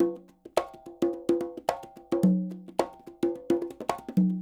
SHUF, Percussionworks; Sampleheads; NYC Percussionworks

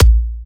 Electro Kicks

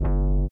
LIQUITAR; Spectrasonics; Metamorphosis

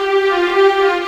Viola, Violins, Orchestral, Rock Pop

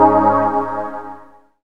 SYN DANCE; Sound & Vision - Gigapack II CD 1

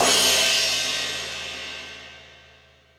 Stadium Rox, Drum Machines, E-MU Pro-Cussion

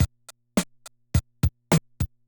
Loop, classic machines, rpm 40

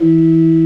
E-3, BOURDON PED, Organ

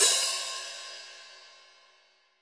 OPHH, STUDIO ST HH; MIX OPHH 1

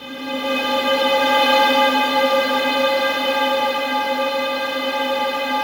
SLOW STRINGS; Music Store; 90's Sample CDs; Yamaha VP-1; SLOWSTRINGS4

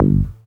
Synth Bass, Roland

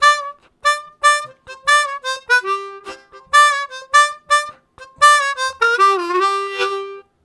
Tentatively, Harmonica; Zero G

